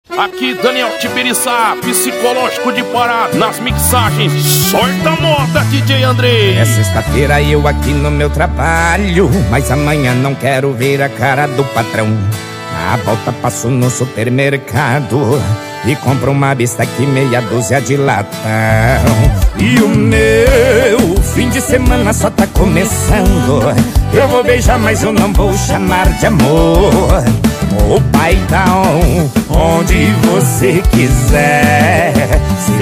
sertanejo com locutores de rodeio

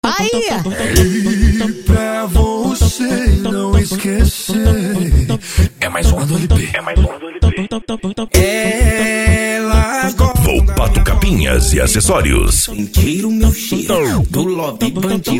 funk consciente e hip hop

